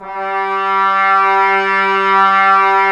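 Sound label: brass trumpets G; 90 s Sample CDs; Miroslav Vitous; Woodwind Brass Ensemble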